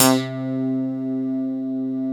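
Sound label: clavinet C3; CLAVNUTZ; Synth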